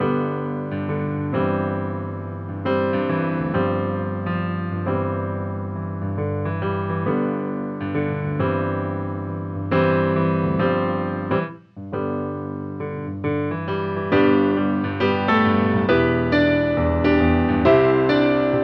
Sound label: Americana EZkeys MIDI Studio Grand; Dusty Preset